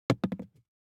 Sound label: DICE on Glass, Throw and Roll, Foam, 1 One Die; Samples; ArticulatedSounds; DICE Sfx Party (Extended)